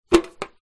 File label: household tin jar teabags lid open